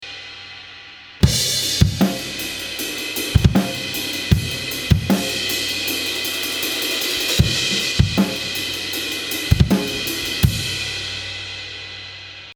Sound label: Section B Room